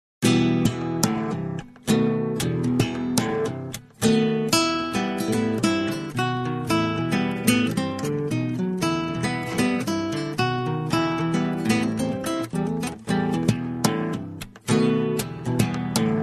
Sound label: Acoustic